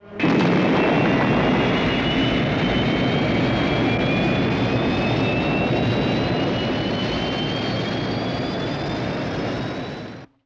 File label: liftoff